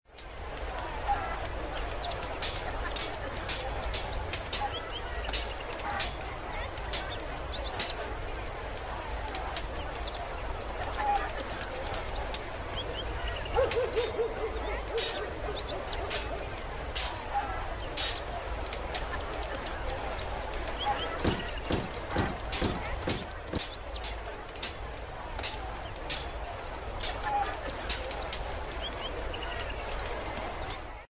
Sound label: city; Minecraft; Votive 1.21.1; Votive Content